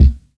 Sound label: sharp kick single shot c key